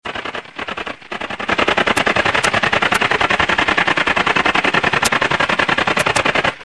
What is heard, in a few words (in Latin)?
tettigonia viridissima; biologie